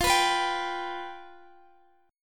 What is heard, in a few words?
F Suspended 2nd Flat 5th, Guitar, DADGAD; Fsus2b5; strummed; x,x,15,16,14,17